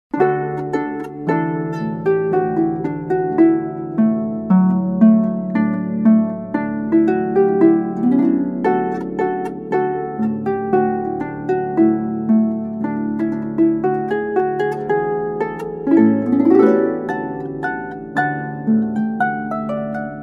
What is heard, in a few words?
trumpet tune